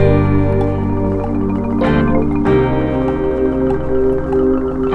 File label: guitar, Phonky loops